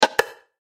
Button Switch

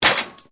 METAL CRASH SMALL

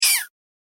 Foley Air Whoosh High; Whoosh Sound Effects

